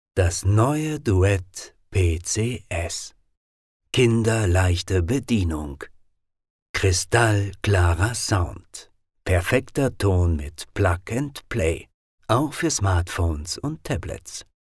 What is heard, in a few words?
Duet